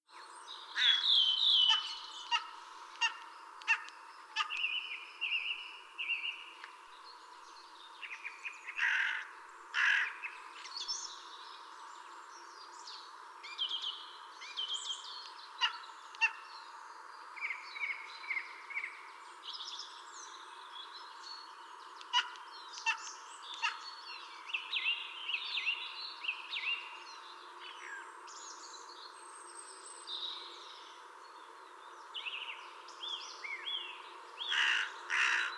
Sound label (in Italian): Cornacchia Grigia